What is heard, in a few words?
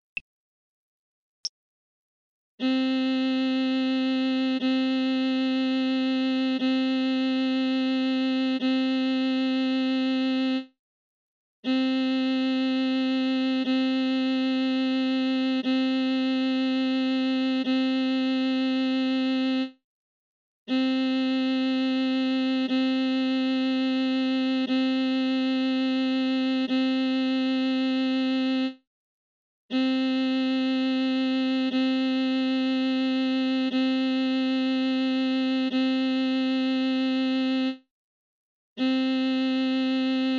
c violin